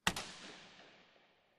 Hockey Puck Hits

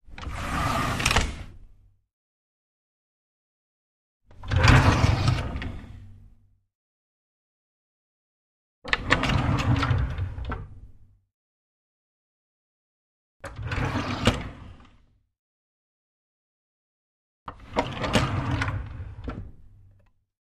Drawer Wood Open Close; drawers

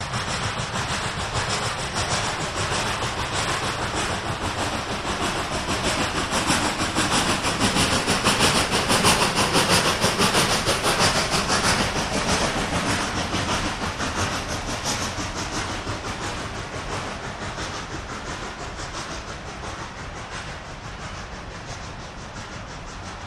Steam Loco Pass By, steam train

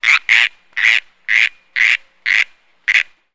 Frog California Tree

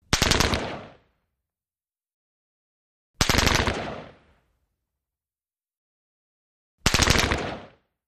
M16 Short Bursts Ster

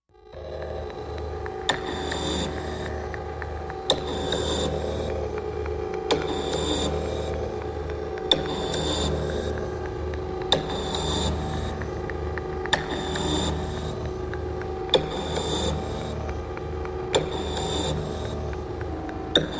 Plunger Machine

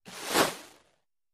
Arrow Sizzle Bys Heav; bow & arrow bys

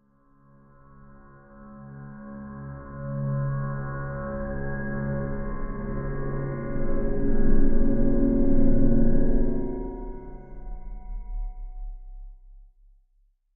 Bed Ghostly Elect Was

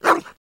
Dog Bark Nasty

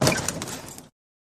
Auto Crash Sml Head, car crashes

